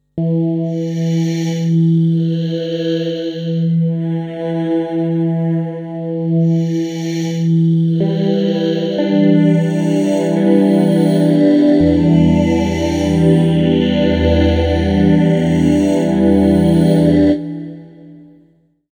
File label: soft crossfade